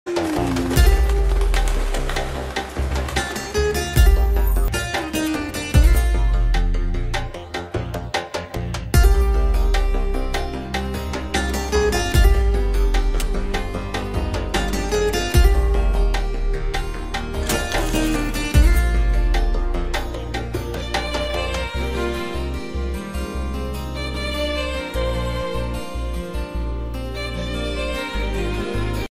Ringtone Instrumental

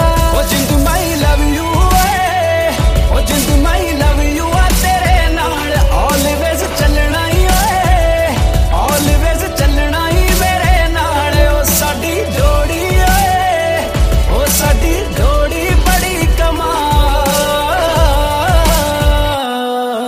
Hindi Love Song Ringtone

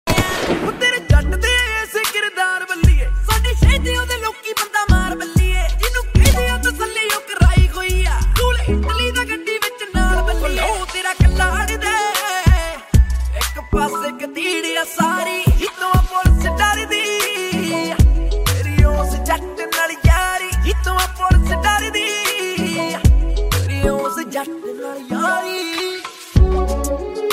Punjabi Police Song Ringtone; ringtones

